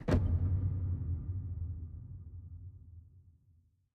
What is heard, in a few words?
reel Bounce; rise of maya